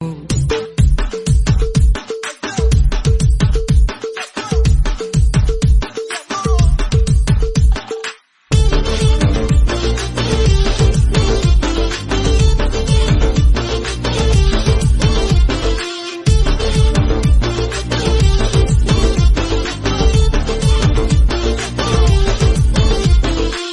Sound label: Arabic Instrumental; Ringtone